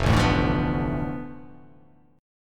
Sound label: F 6th Flat 5th F down, chord